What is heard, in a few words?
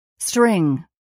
string